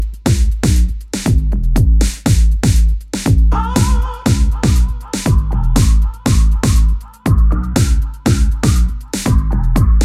Instrumental

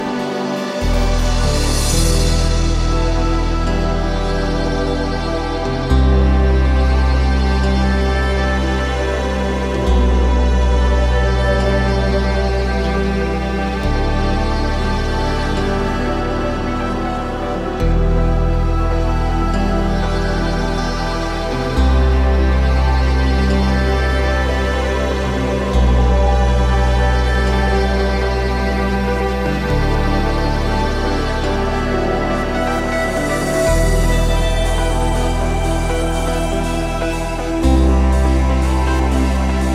Ambient Version

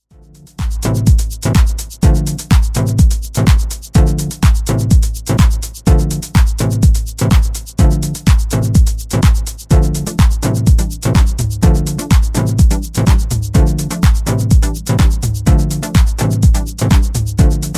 Instrumental Version

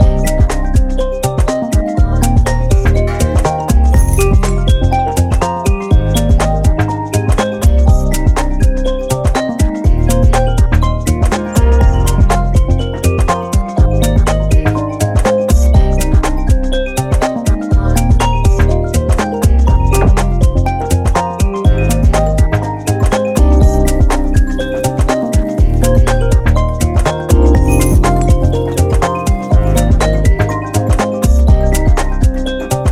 Arp

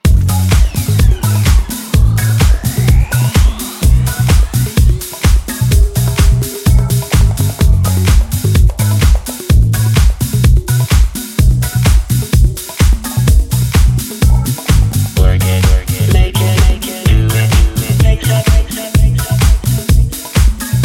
Disco Punk

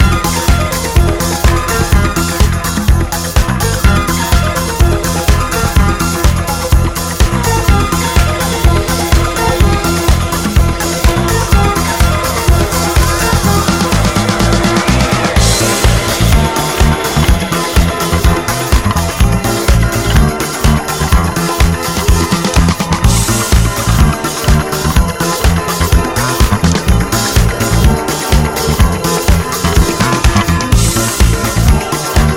Instrumental

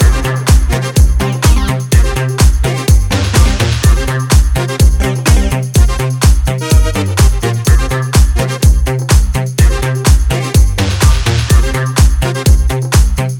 Instrumental

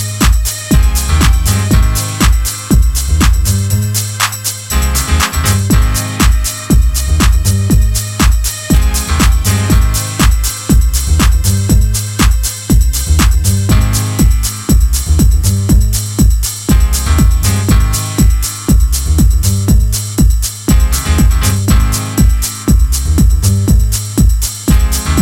Instrumental

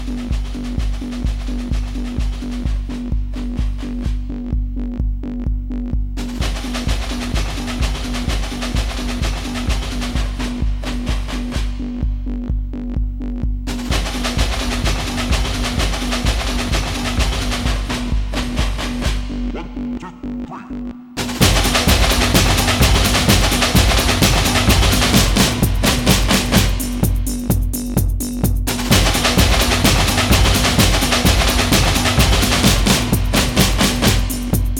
20 Hz

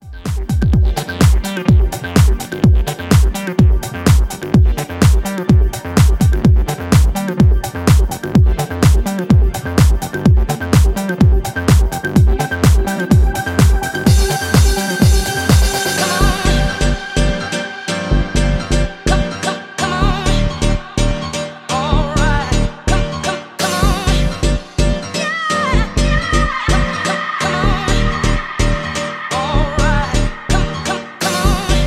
piano mix

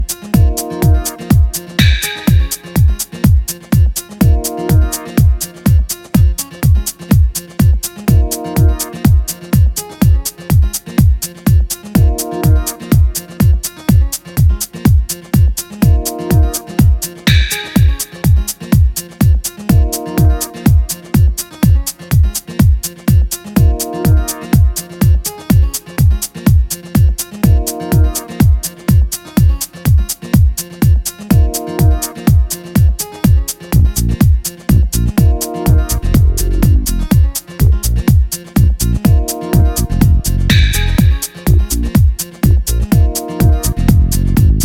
Dub Bass Mix

